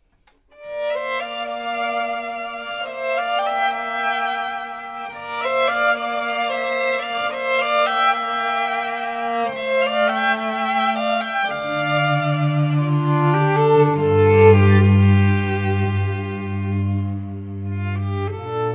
strings; classical musicians

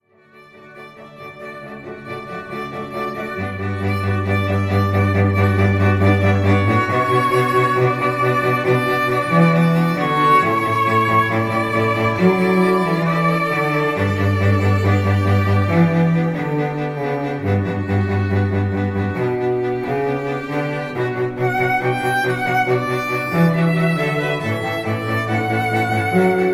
strings

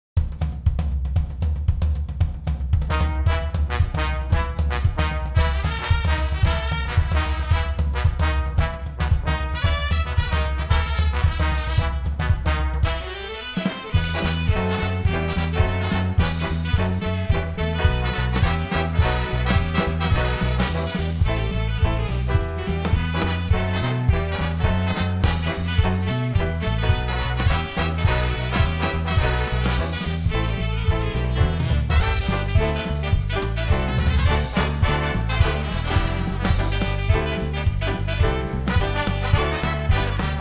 big band

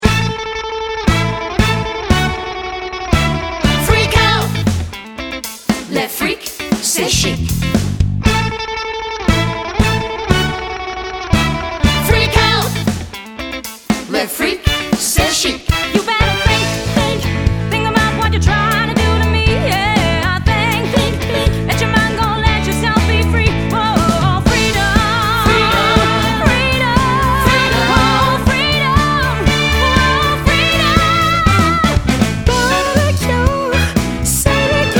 funk soul mashup

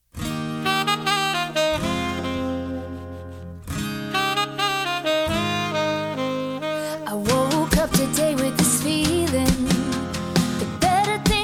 quartet

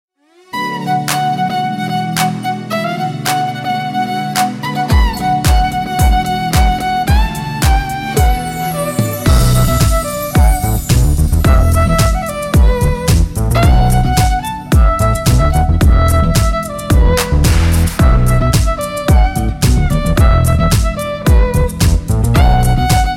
violinist; classical musicians

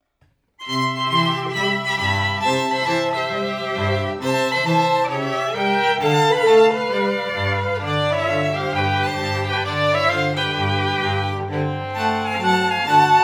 strings

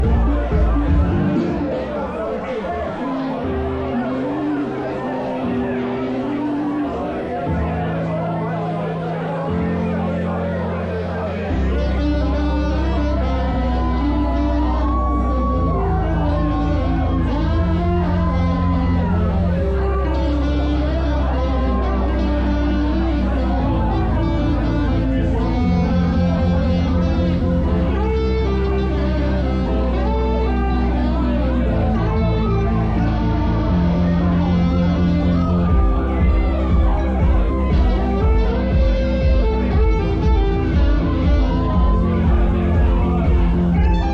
sax

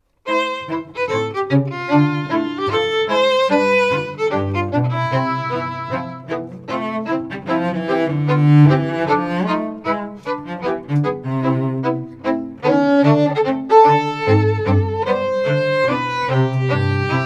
strings; classical musicians

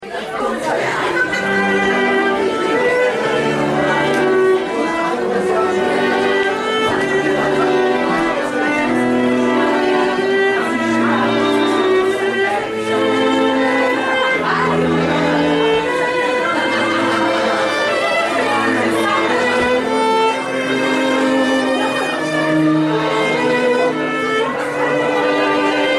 strings

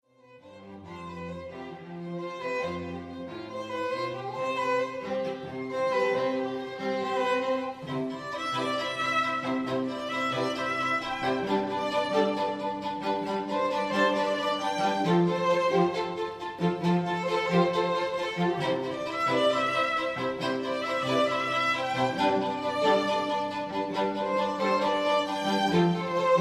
strings trio

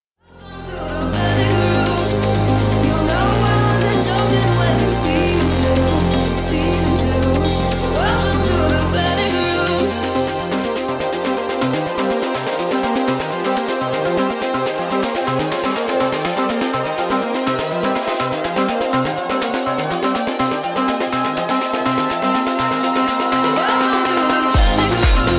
bongos

